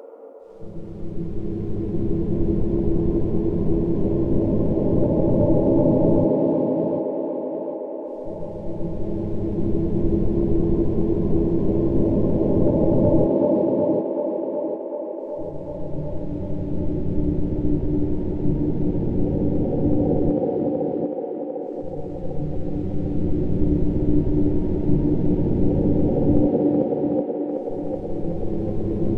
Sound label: Dystopian Wasteland, sci fi